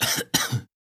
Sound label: cough; krunkitis cough